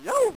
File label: youch